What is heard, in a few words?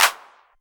Clap, Novation Drumstation; DrumstationV1